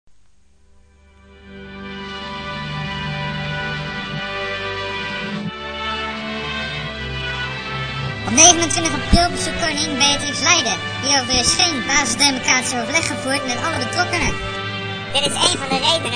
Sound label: jingle